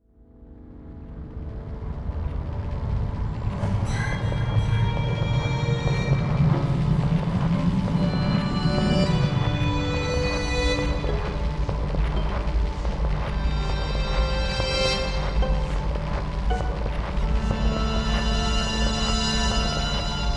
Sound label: orchestral devilish jig